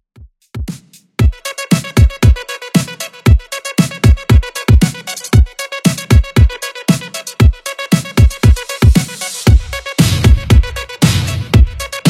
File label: 80s Retro Redrum 116bpm